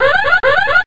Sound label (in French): Alarme